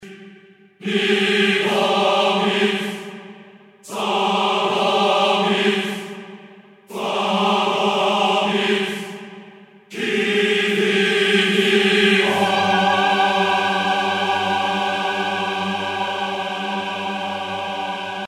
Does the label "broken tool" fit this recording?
No